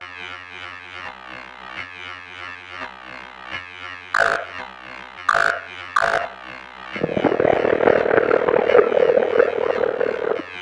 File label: swamp